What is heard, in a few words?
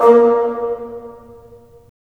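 wind bassoon; LA Composer vol. 3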